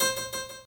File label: harp